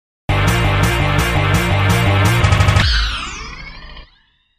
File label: Chainsaw Guitar Sting